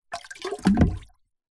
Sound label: foley metal pole section drop sink 2ft water plastic tub thud